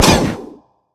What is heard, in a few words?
Primal Shoot